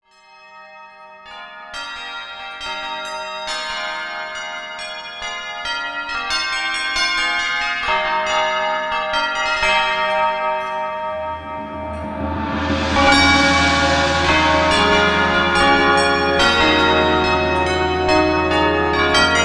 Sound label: carillon; acousmonuments